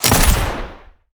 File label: handgun